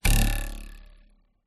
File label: cartoon bounce jump ruler twang